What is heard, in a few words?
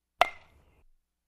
Aluminum bat hitting ball; Technology